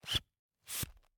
Hand bellows, leather, wood, brass, open, close, wheezy, blown air, supple, creaky; Eiravaein Works; Vaeyan IV